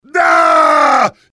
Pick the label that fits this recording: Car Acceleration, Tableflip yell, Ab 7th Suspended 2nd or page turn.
Tableflip yell